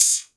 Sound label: HH, 160 BPM, Drum & Bass; Ueberschall; Drum & Bass Flash Trackz